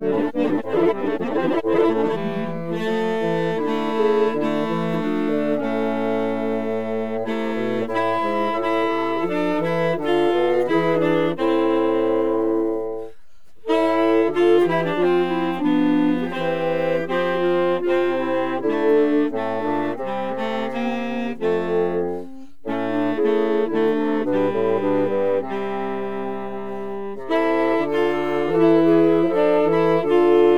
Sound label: Bach Chorale resyn; scorealign